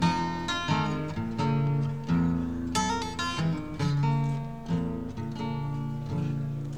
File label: cadence